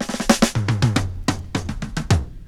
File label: DRMROLL; E-Lab; X Static Goldmine 2; akai